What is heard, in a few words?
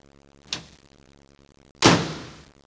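door close, fire; disaster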